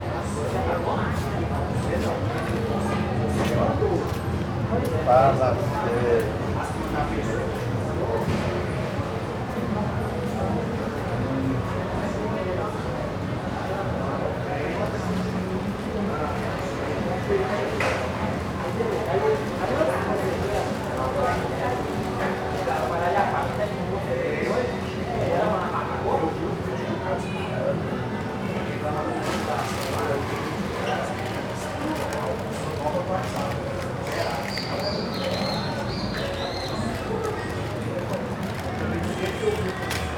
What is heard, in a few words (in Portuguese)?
Ambiencia mercado goiania muitas pessoas, homens, pegando embalagem de com